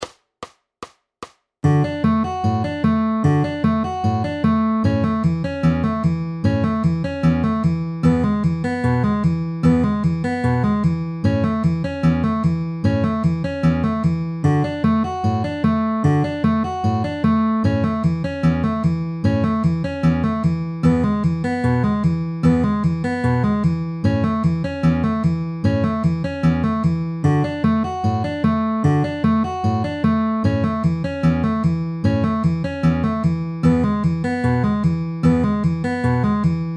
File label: D A E A downward arpeggio middle middle with alternating bass at 150 bpm